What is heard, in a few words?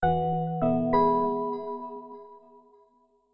Startup; Windows NT 8.0; Windows Never Released Soundpack 1; deviantart